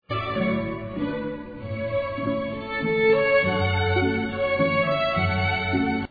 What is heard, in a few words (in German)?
walzer